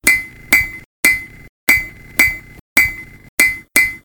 tradeskill blacksmithing

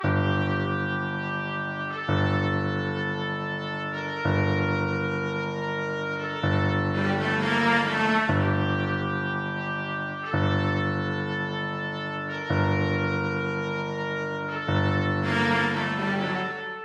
holiday halloween, music

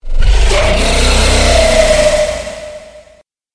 monster shade

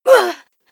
grunt female

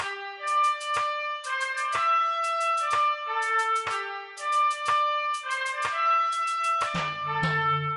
holiday 4th of july, music

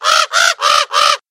monster raven

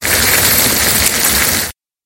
monster fire mites

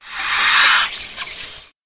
monster king cobra

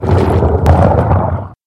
monster shark

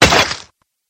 hit polearm

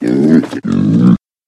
pig

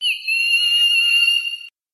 monster phoenix

